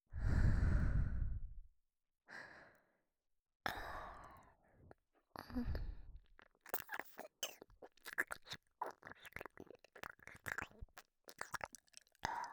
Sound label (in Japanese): 耳舐めリピート(左右反転版)